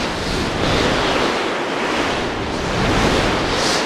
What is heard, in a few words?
rolling thunder; LoL; volibear